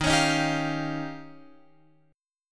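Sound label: E flat 13th E flat, down, Guitar, Open F; strummed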